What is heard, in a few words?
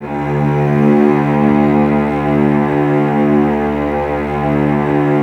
cellos D 2, String Ensembles; Miroslav Vitous; 90 s Sample CDs